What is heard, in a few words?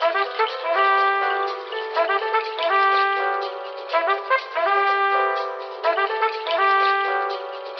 horns bump bump bump, loops; Phonky